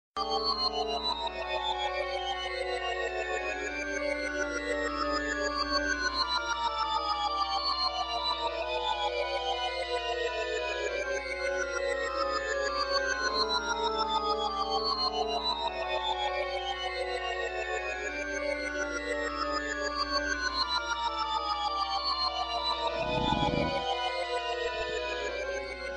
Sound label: Eerie Abyss